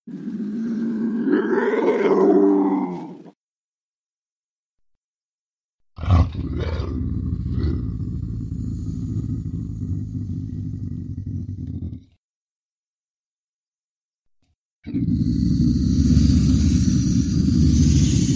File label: Lynx Sounds